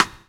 Rimshot; Equipped Music; Loungin House

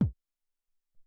House Kik, Alesis SR-18

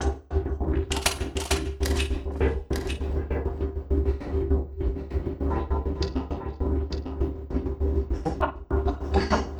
Envelope Beat; Drums & Drones

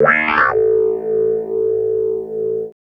Clavinet; Ambient Grooves

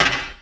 Percy Hit, FX; Sonic Foundry (Sony Creative Software); Esoterik Beatz

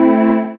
DR. LOOP; X-Static Goldmine 3; CHORDS

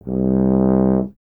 BRS TUBA, Solo Instruments

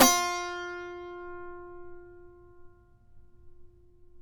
SAZ; Spectrasonics; Hans Zimmer Guitars VOL-2

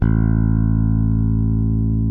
SB A0 SOFT, SPECTOR BASS; Northstar - New Gold VOL-1

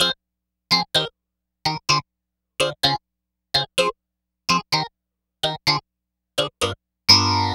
Clavinet; Dr. Fink's Funk Factory

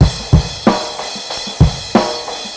Cym bash beat 93bpm, Hip-Hop Street Beats; Sonic Foundry (Sony Creative Software); Hip-Hop Street Beats Construction Kit; Street Beats by Poogie Bell

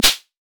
Shaker Pairs; Shakers

